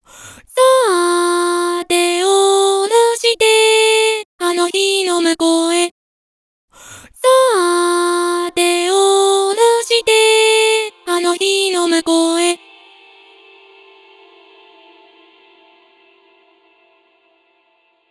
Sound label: UFX Delay, vocaloid